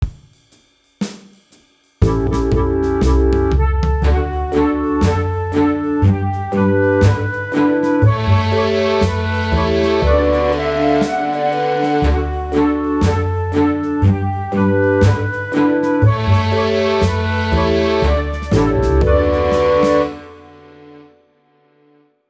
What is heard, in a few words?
Little Brown Jug cello practice tempo